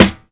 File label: natural snare sample g key